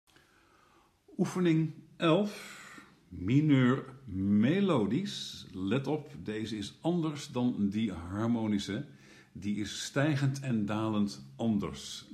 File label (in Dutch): Mineur toonladder melodisch; Oefening 11.